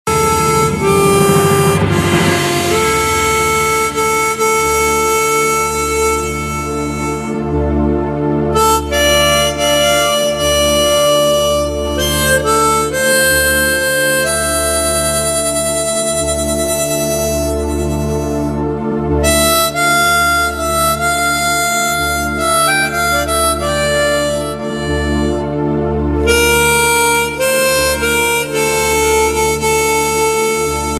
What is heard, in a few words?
Mouth Organ Tune